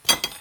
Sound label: metal fork